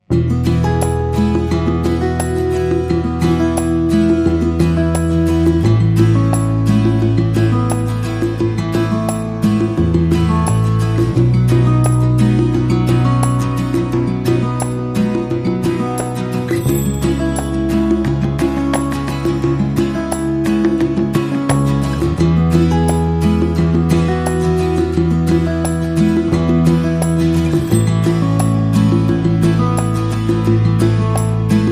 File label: No Pedal Steel Guitar